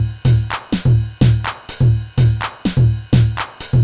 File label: drum fixed top 125, Classic Chicago House Drum Loops